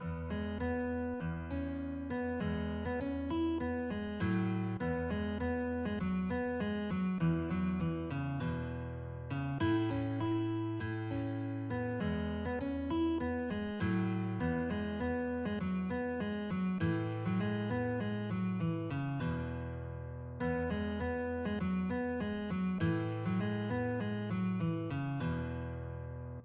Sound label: guitar